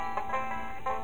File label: banjo